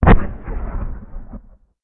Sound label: Amb Destruction; Red Orchestra Ostfront 41-45